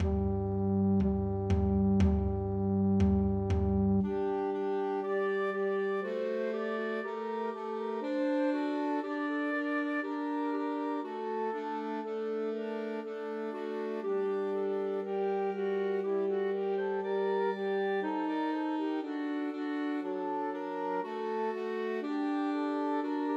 Pat a Pan Christmas Trios for Flute Clarinet and Alto Saxophone